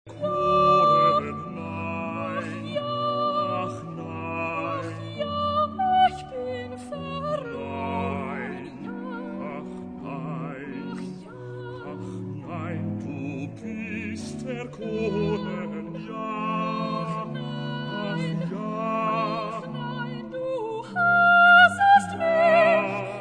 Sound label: bach cantata